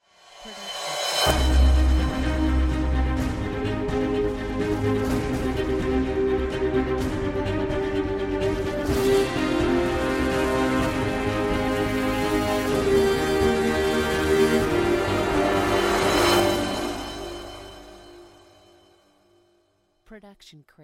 epic logo; soundscrate

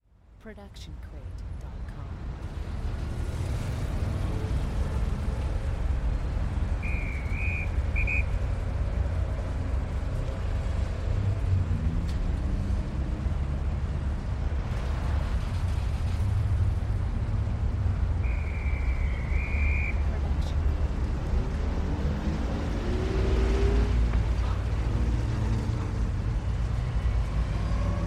city ambience; soundscrate

